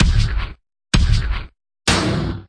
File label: 96 80s pop meets industrial, drum loops and breaks; 091 to 100 bpm